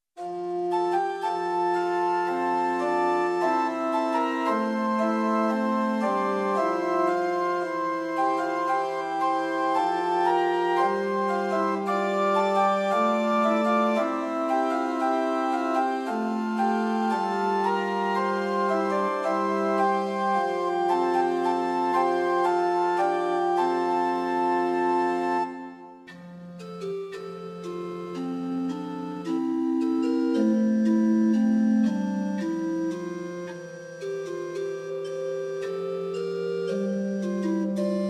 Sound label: Organ Temperament Meantone; hymn